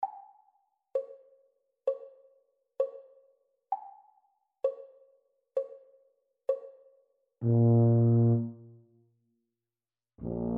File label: Bb Tuba Duet practice speed; Grade 1